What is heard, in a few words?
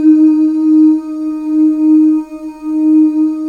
BGV OO; Spectrasonics; Symphony of Voices; BGV OOS 2